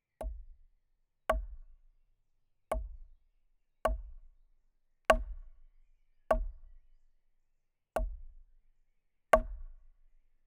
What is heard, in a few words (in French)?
Baton sur buchette x8; Percu Bois